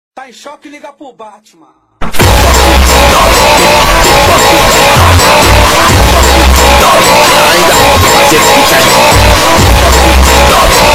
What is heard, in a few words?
phonk